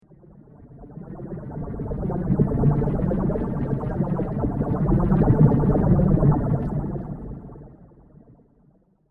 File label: Ufo Crop Circles; Spacecraft Sound Effects; science fiction sound effect